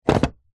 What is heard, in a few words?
household vacuum cleaner hose nozzel attach to base; zapsplat